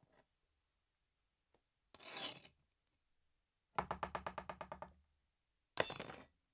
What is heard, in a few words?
Sword Pick Up And Throw